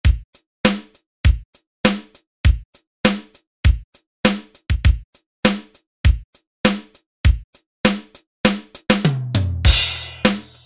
E-mu Proteus VX

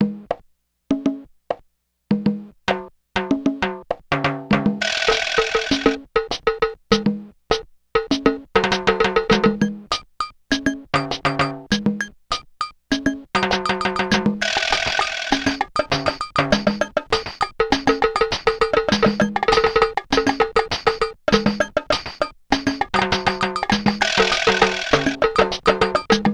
LATIN PERCUSSION

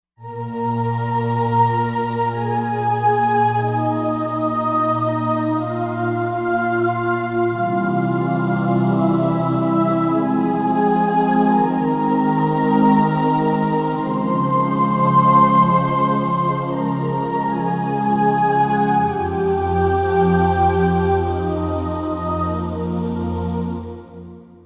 Breath Choir

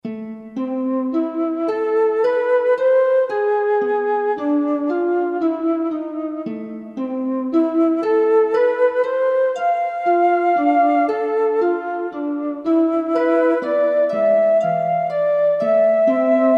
Harp & Flute, Roland SR-JV80-16 Orchestral II

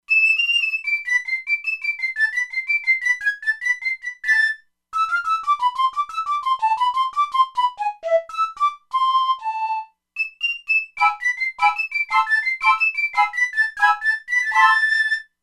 Hi Wind Solo, E-mu Proteus-2; Factory Presets

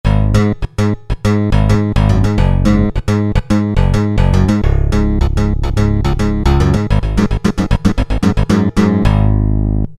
Steelblue Bass, Roland PN-D50-04

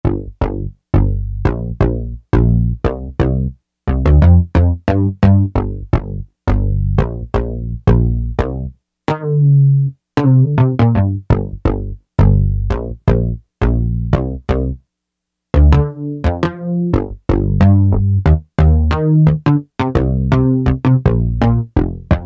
Syn. Bass, Korg MSC-2S Synth; Synth 1

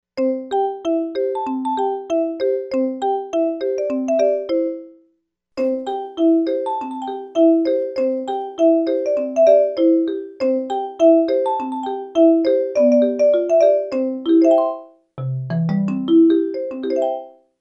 BASIN

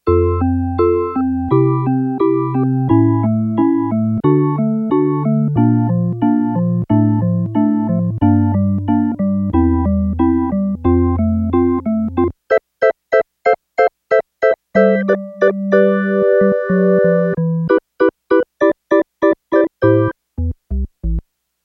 SoftOrgan, Yamaha TX802; Factory Patches; Voices

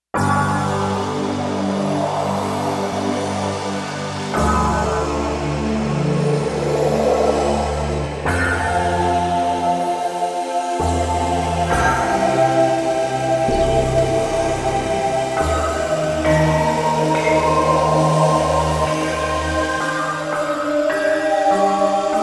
Bamboo sweep, synth

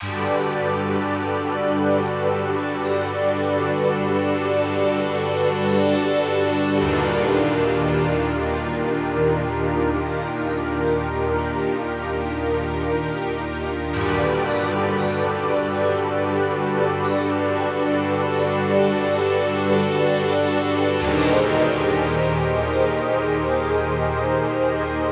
Atmosphere, Korg Radias-R

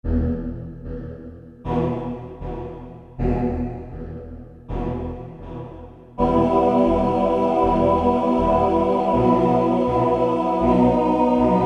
Choir, Roland U-220; Factory Patches

